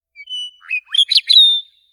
Sfx Environment Meadowlark; Akxolotl

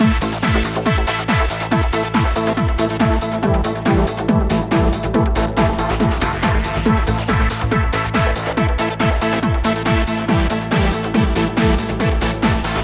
Pre-match music; FIFA Soccer World Championship; PS2

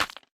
Weapon Bone Big Stinger

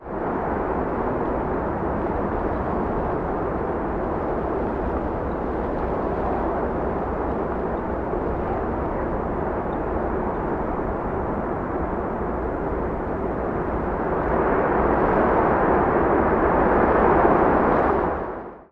Meteor Wind

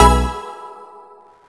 drum

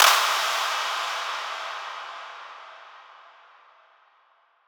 Clap Impact

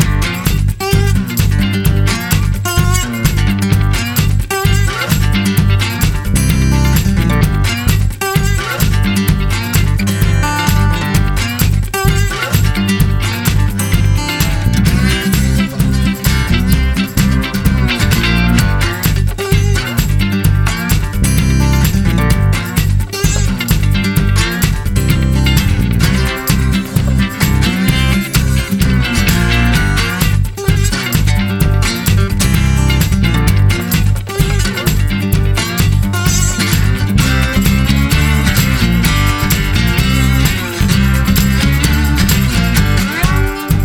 Game BGM

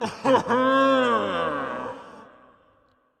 Toxic Mask Big Laugh; Going Under

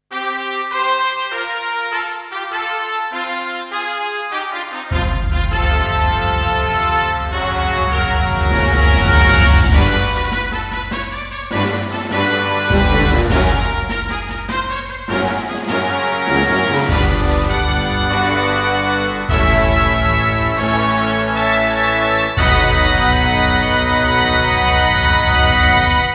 Fanfare Far East

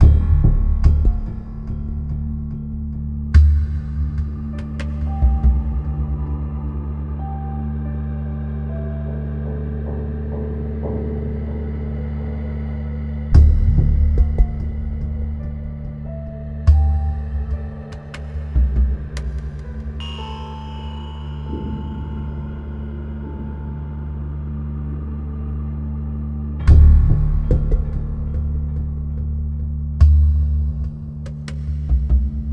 Amb Outdoor

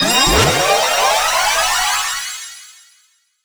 Slot Stamp

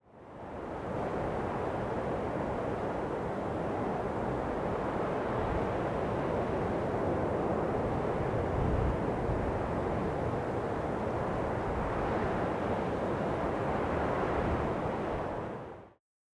Birjand Wind

